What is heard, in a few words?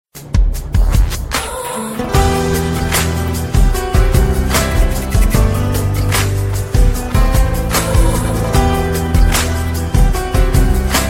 cool tone ringtone